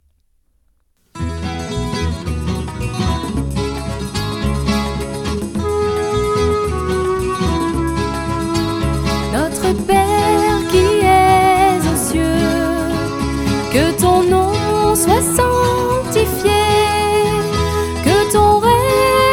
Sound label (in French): Notre Pere version rythmee